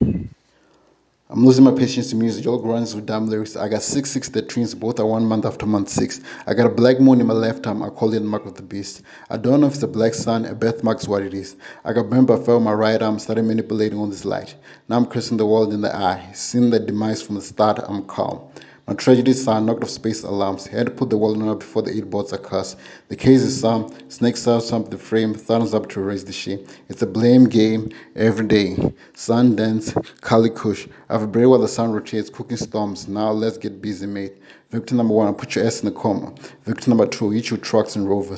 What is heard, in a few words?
Rap; Rap Olympics